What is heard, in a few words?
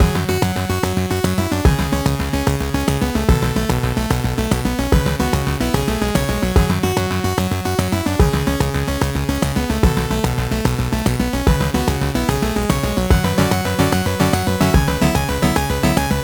ShootingGallery Game C (110bpm); Giant Consequences